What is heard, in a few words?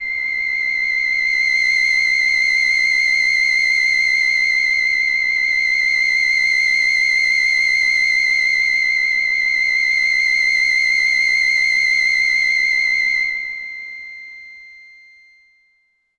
White Siren L C6; pad; Kawaii